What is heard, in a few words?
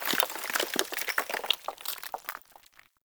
Falling Sand Rock; Warframe; Man In The Wall